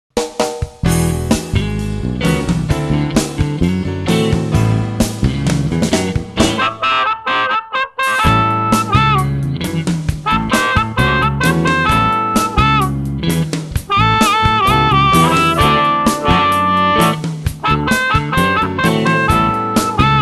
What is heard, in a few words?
little harp, ampli lampe